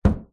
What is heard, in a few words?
household door internal close med slight distance room reverb; zapsplat